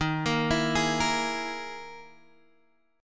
E Major 7th Flat 5th D sharp

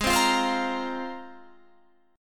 G sharp 6th Add 9th G sharp Guitar Standard; strummed; JGuitar

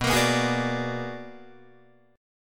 B-Minor Major 13th B down Guitar DADGAD; BmM13 strummed

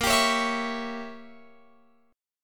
A sharp Diminished 7th, Guitar Open F; A#dim7; strummed; x,17,19,20,19,20

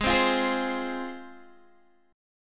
Ab 6th Ab, Guitar, Open D

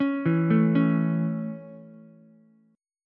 C sharp Minor Sharp 5th down Ukulele; C#m#5; arpeggiated; JGuitar

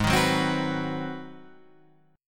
Ab 7th Sharp 9th, Guitar, Half Step Down

